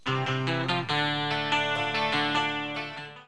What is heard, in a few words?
RAIN